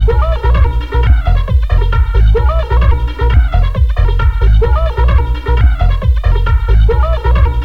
BBD 1024 sequenced flange

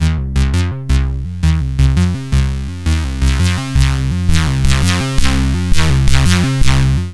LP fade in HP

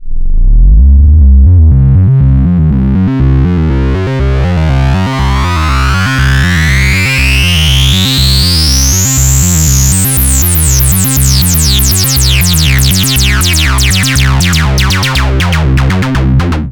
A106 hires lo clip

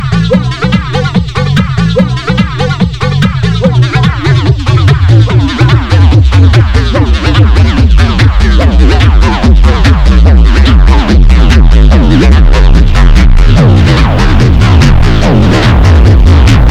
BBD Envelope

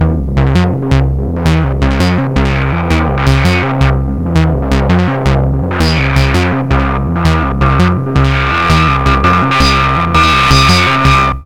spring fb

